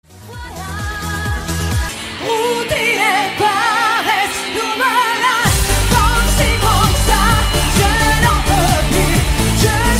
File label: new french ringtone